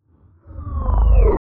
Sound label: Movements; 99Sounds; The Weird Side Samples